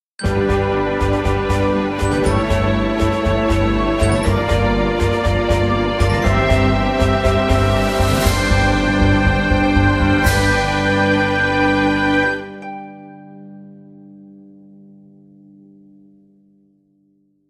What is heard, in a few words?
levelup